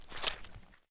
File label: swamp walk; Escape from Tarkov